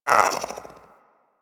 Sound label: Pet Speak Short; Warframe; Catbrow; Pet Ship